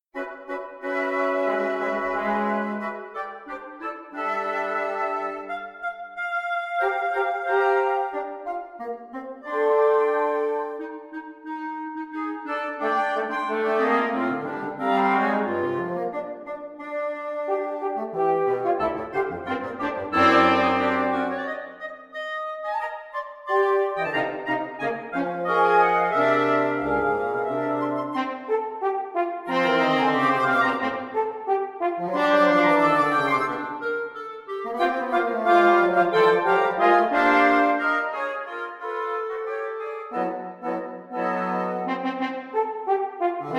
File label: quartal bells